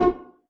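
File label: pizzicato C2 PP, STRING PIZZ; Sound On Sound - Peter Siedleczek's Orchestra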